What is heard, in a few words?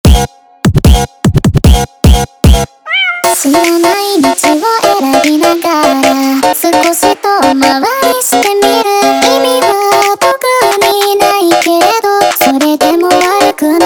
Montagem sped Up